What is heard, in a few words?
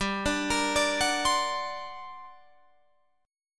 G Minor Major 11th, G, Guitar, Drop D